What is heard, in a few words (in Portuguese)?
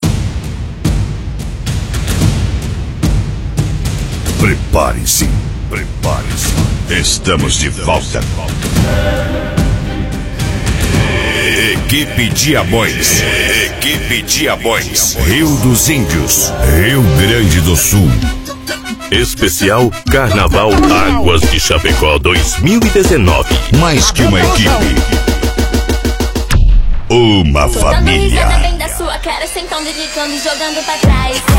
Carnaval; Carnaval 2019; Aguas de Chapeco